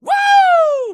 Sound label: woo